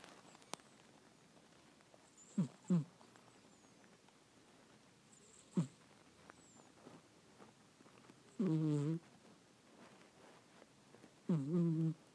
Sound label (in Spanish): pujido